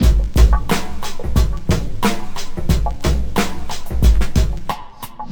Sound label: Packet, downtempo beats